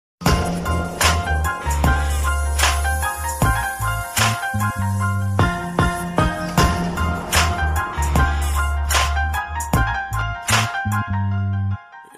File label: Ring The Ring Sms